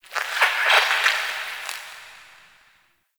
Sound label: Critter Squeak; Warframe; Ambience; Nokko Colony